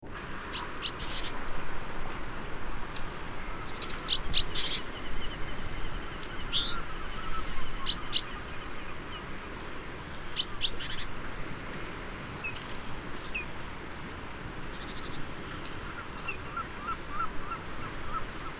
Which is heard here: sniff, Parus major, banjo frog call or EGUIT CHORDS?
Parus major